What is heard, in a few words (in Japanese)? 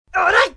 kougeki kyou, 攻撃強; 熱血馬鹿が好きなんだ。; ゲーム用フリー音声素材集; 上記と同コンセプト。より勇者王的な感じ。